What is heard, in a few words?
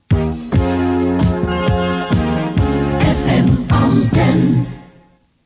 jingle; FM Antenne D